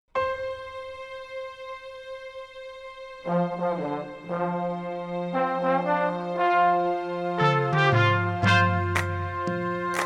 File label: Yonder Come Day Alt Solo